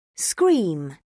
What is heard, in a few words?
scream; Oxford Word Skills Intermediate